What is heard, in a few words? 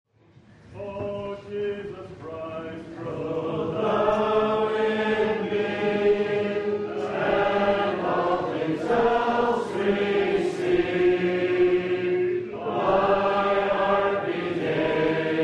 Hymn, Gospel Hall; 2015Conference